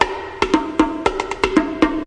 silly bongo verb, drum loops and breaks

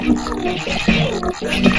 SYNTH AND ELECTRONIC; MELODIC SAMPLES; hagalaz6

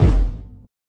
floppy bounce kick

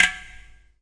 clay pot hit

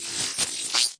one billion khz; Electro and Synthetic

